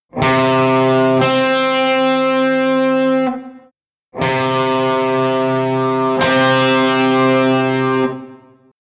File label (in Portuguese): Intervalo de Oitava Justa